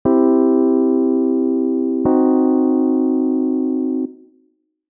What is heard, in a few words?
c v c dim